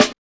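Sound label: snare drum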